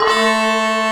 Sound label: ATMO POTPANS, Brass Wind, POP BRASS; AKAI S6000 CD-ROM - Volume 1